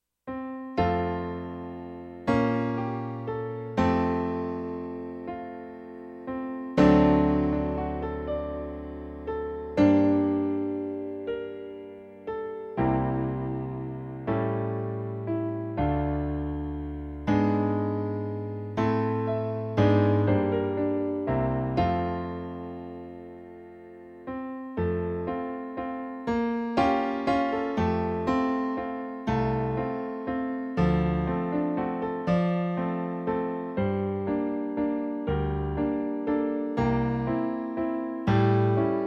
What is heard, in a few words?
Chopins Nocturne Piano